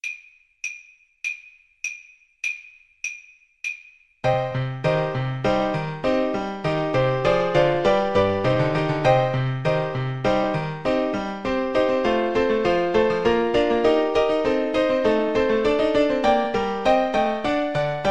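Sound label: bach badinerie alto saxophone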